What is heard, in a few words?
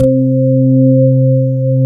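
choir organ C3; PAROISSE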